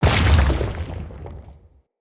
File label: Wrath Head Spike Burst; Bromius; Abilities